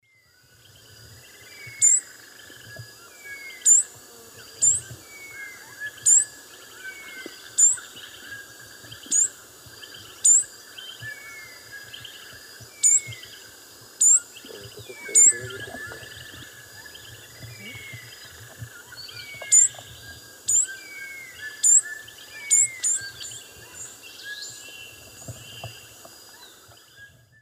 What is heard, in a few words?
Tachyphonus cristatus, calls; S. America; Eastern Lowlands